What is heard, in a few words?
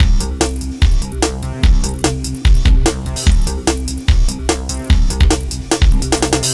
Reso Loop; Sonic Foundry (Sony Creative Software); Dr. Fink's Funk Factory; Complete Loops